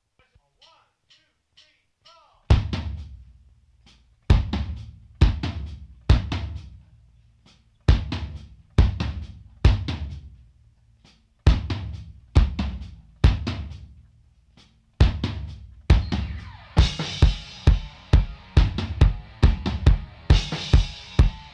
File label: drums